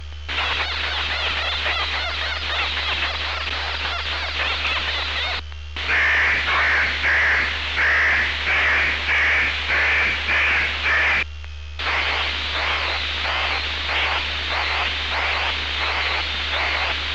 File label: Bird signal